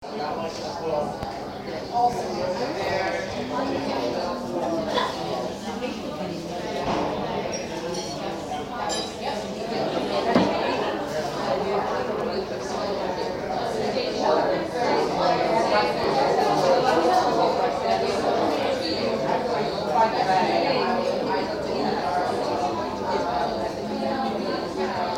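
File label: a boo from arthur s pub dublin, crowd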